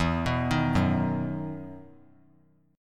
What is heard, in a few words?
E Major 7th Suspended 2nd; EM7sus2 arpeggiated